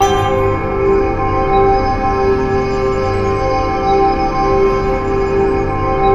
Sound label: IMPULSE; NorthStar - The Wizard VOL-2